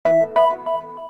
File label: open; LG KU3800 Bubble Pop